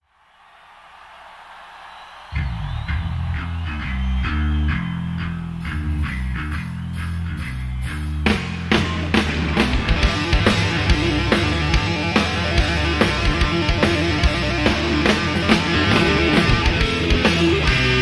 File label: Encore Jam, Live; Long Beach Arena, Long Beach, CA